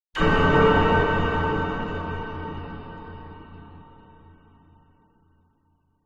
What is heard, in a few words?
cinematic hit strike dark fear; sound design